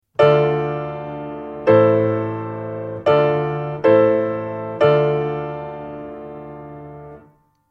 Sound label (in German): akkordfolgen